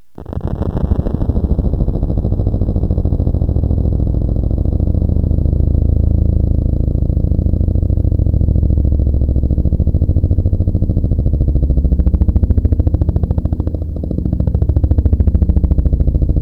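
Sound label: helicopter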